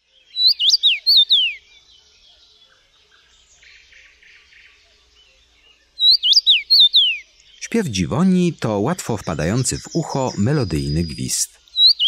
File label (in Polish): DZIWONIA, Ptaki; Kurs PTTK